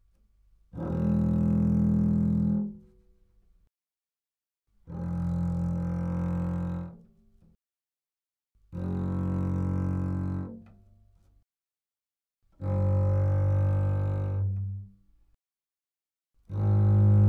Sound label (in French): contrebasse